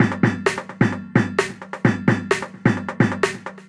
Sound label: Drumkit; Ableton; Factory Packs